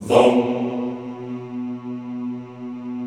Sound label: Classical Choir Hmmm B1; Best Service; Extended Classical Choir